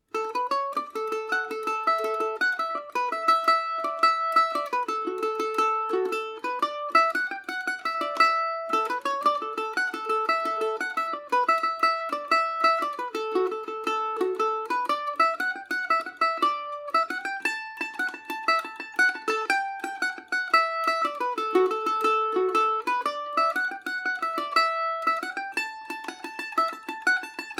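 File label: calliope house D major, irish mandolin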